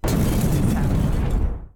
crash; BALLY; TWILIGHT ZONE; Pinsound

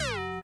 ting; BALLY; TWILIGHT ZONE; Pinsound; Twilight Zone Reorchestrated

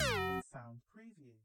ting; BALLY; TWILIGHT ZONE; Pinsound

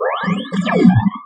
guitar tone; BALLY

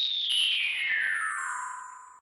wish sound down; sfx; WILLIAMS; INDIANA JONES; Indiana-Jones-Original

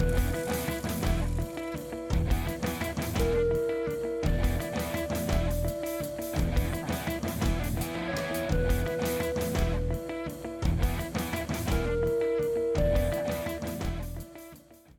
powerball prelaunch; BALLY; TWILIGHT ZONE; Pinsound

